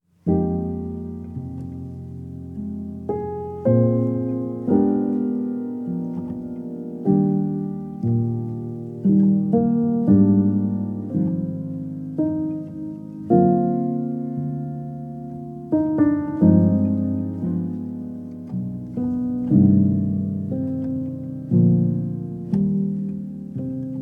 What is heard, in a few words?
Hummingbird Solo; jazz